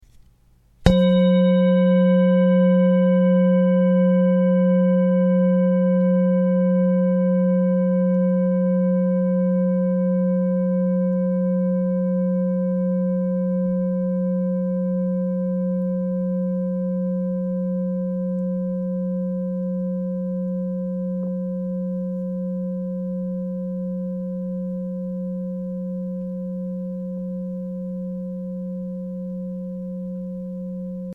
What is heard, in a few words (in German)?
Klangschale